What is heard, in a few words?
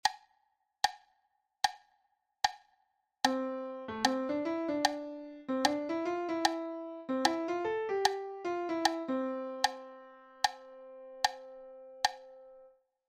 syncopation